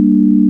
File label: sine; 音の波形と分析